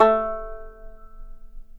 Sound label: banjo, Guitar; Notes